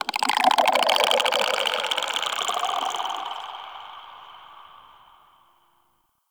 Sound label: Infested Devourer Vocal; Warframe